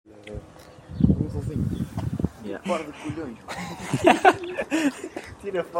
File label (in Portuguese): gato